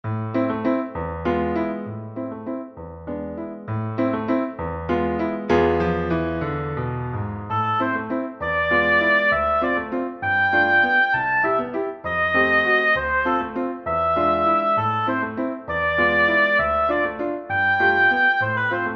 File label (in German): Oboe klavier; Besetzung: Oboe und Klavier; Tonart: a-Moll